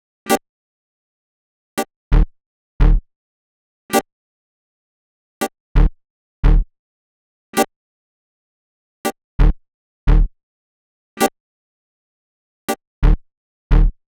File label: 132 Em Synth, Complex Electro Synths; Prime Loops; Ossify